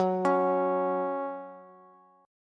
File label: Gb 5th Gb down Bass; Gb5 arpeggiated; JGuitar